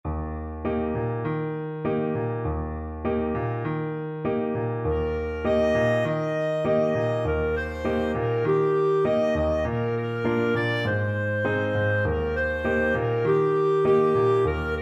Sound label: kanzenzenze, clarinet